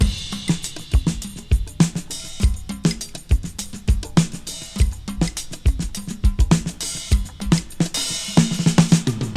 101 bpm modern breakbeat sample g key, breakbeat sample - kick tuned to the G note; drum loop